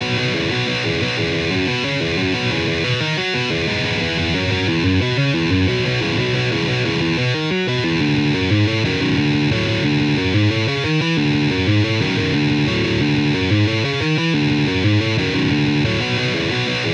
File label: guitar